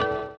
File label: orient, bizarre guitar; Spectrasonics